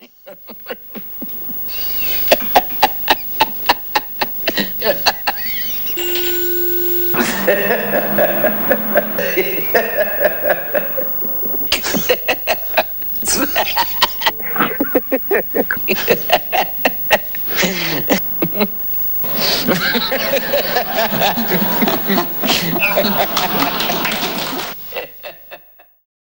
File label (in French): audio rire